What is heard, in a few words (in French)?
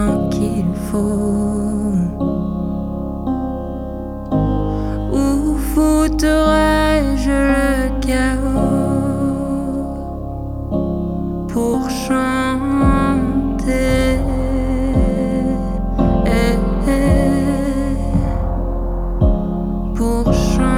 piano voix